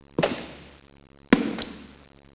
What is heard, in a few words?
boots, fire; disaster